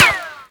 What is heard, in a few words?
ricochet, bullet impacts; physics; Half Life Model Pack